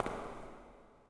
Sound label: footsteps echo